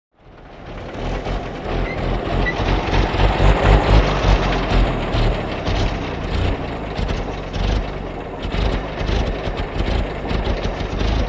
Automobile; In / Stop / Off; Buick Up, Stop, Idling And Switch Off. Lumpy Engine; 1928